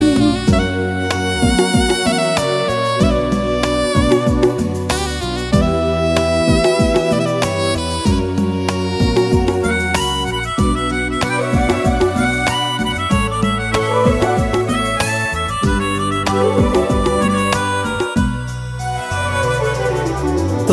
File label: instrumental music; ringtone